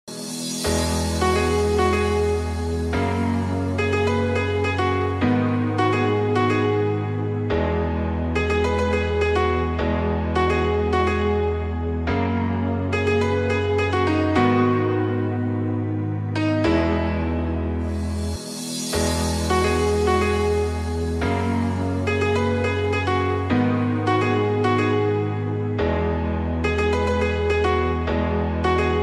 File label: piano jam